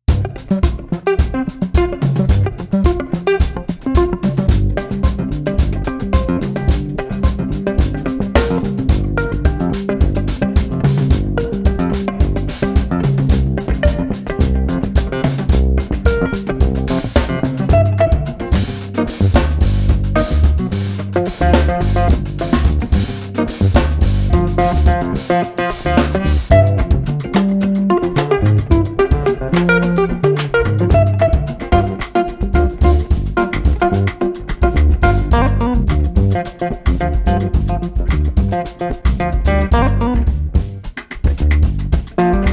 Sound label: Guitar Funky Combo 109